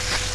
slide

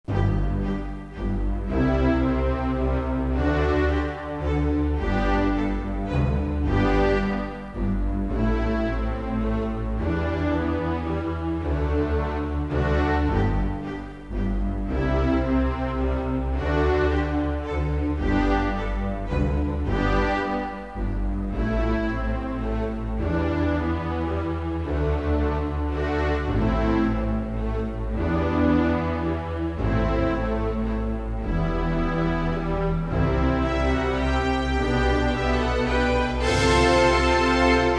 Stately Waltz; SoundEdit 16

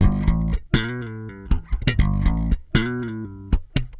Bounce Slap Basso; TOB STUDIO 9; by Nikita

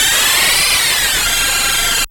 rez sweep, synths dancerap; The Sample Bank

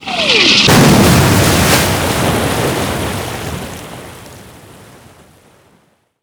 incom water; 1944 Battle Of The Bulge

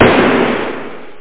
snare; Aminet 21; GTI - Schatztruhe; 8,363 sample rate

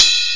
a bell ride

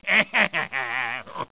Ah Laughs; Bible Fun Pack; Education Publishing Concepts; NOAH

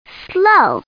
SLOW

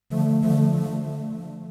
wings, 140bpm keyboard; hous-tec

